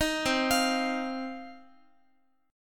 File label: C Minor Double Flat 5th C down Guitar 4A 4E 5A 5D 5G sharp 6C sharp; Cmbb5 arpeggiated; JGuitar